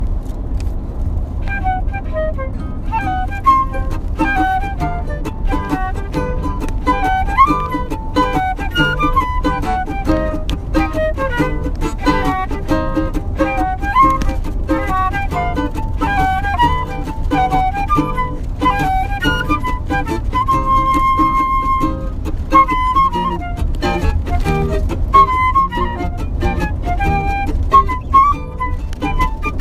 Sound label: Zingy Swingy Waltz